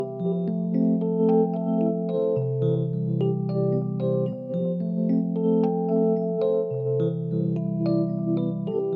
Rhodes

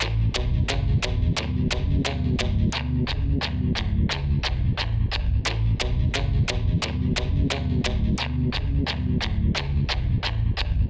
88, loop synth low octave plucks, B min; bowa

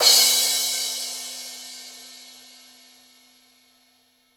122 CRASH CYMBALS

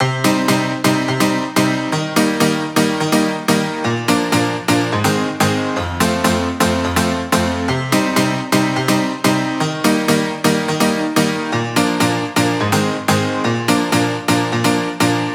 Music Loop Wet 125 Cm

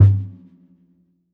Snakeskin Kick

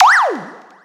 Lazer

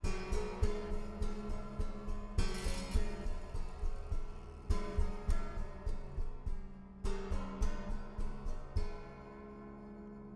Zither Hand Hits

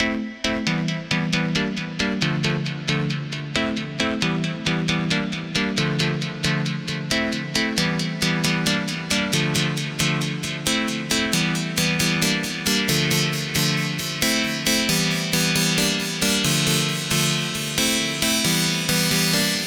Synth 135BPM F-m Chord Filtered

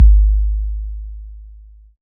Kick Analog Mono

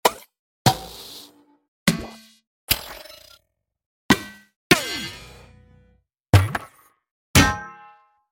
Dirty Percussion

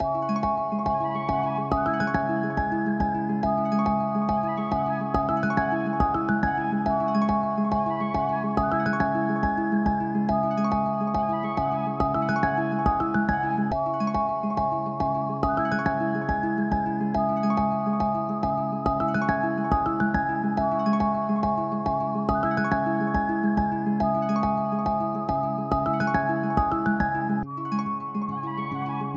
140 C m Dark Ethnic; Out Tha Mud

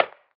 Organic Perc

